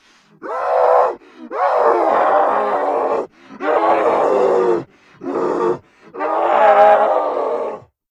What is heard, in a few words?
Scream